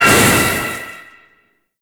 Void Release Blunt, Weapons; Warframe; Duviri; Incarnon Adapters

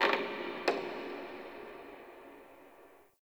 Wooden Creak Small; Warframe; Ambience; Nineteen Ninety Nine

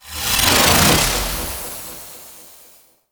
Ship Void Teleport Particles; Gameplay; Ambience; Warframe; Corpus Ship Remaster

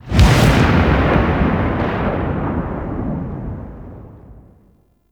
Portal Spawn; Gameplay; Warframe; Chimera Prologue

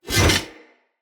Whoosh, Swords; Weapons; Mockery; Turash

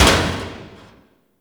Destruction Short Metal Hits; Ambience; Warframe; Corpus Railjack

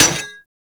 Melee Axe Impact Generic; Axes

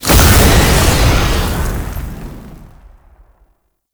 Lasrian Tank Effervon Spray Stop; Weapons; Warframe

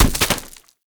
Bird Of Prey Bodyfall Dirt; Hunting Critters

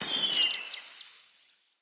Bow Arrow By; Weapons; Player; Bows; DendritBow

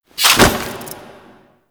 Foley Sword Tap; Orbiter Cradle; Stalker; Tenno; Cinematics

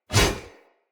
Whoosh, Swords; Weapons; Mockery; Mockery Brazard

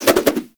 Nunchaku Spin Whoosh; Warframe; Tenno

